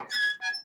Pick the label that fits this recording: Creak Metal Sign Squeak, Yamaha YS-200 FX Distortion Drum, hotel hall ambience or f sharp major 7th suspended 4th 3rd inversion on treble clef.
Creak Metal Sign Squeak